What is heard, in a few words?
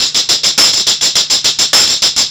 TAMB; Zero-G; Chemical Beats